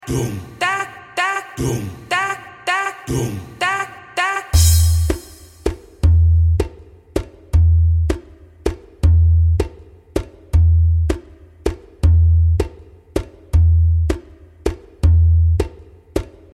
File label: malfoof, rhythm; rhythm CD for teaching